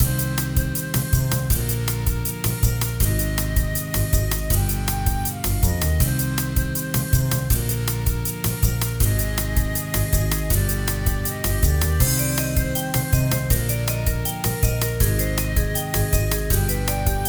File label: Pause Muzak; Sussus Muzak